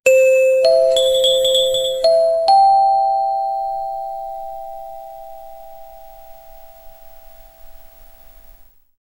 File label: a holidays tone or chime, doorbell